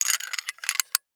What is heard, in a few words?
padlock latch movement; Whodunnit